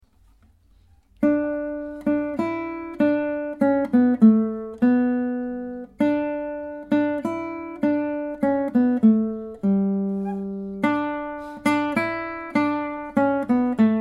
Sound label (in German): gitarre